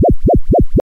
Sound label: freq sine 4hz